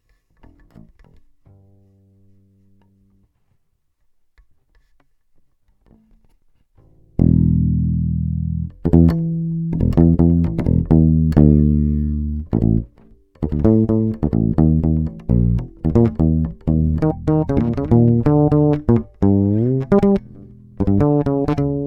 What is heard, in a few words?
norm Bass USB